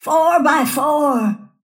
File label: move pain; snapfire